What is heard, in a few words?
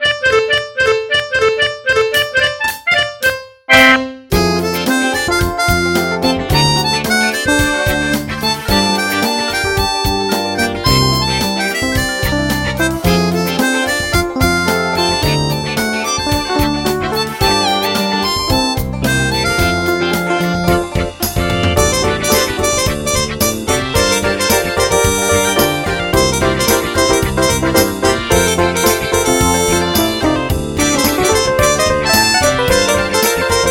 pop Remix